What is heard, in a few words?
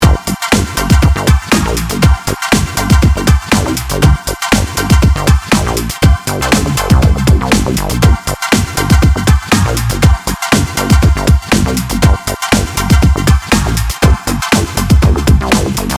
Dance Track